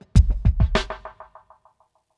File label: drbreak, drumbreaks; Masterbits; Vinyl Attack